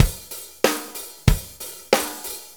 Shuffle Loop, Full Kit Loops

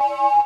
JV KIT; Pro-Rec - Synthsonic; JV ANALOG2

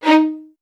XST1 D#4, LOUD VIOLINS; Q Up Arts; Denny Jaeger Master Violin Library

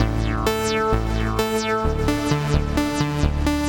Disto Stomp Mix A 130 A, modular 130bpm; modular madness

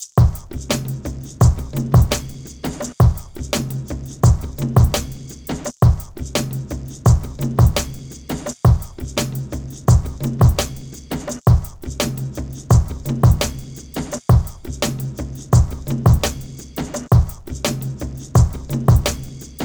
Drums, Hip Hop

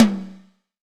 R-8 dry tom; EdgeSounds; Drum Mashines; R-8 DRUMS

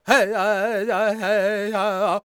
C# MIN, World Voices, LAKOTA

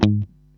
G#2, funky guitar

